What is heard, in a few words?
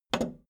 video tape player plastic rewind click fast